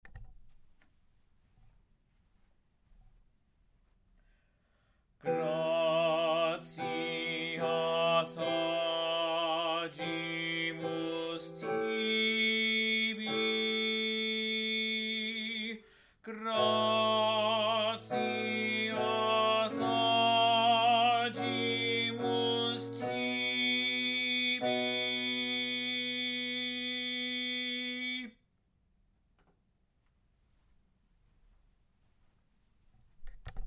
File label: Bass; Gratias Agimus Tibi; Gloria in D, A. Vivaldi